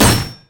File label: rumble carpet bomb